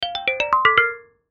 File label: Musical Kalimba, Musical Kalimba Melody Happy; Hit; Blastwave